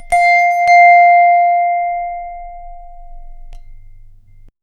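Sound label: a clean dry audio recording, single note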